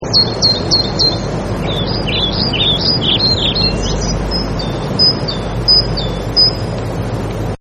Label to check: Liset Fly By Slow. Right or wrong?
Wrong